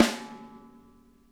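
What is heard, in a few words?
snare center; Sonic Reality; Interactive Drum Kits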